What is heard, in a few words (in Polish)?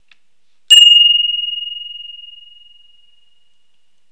Dzwon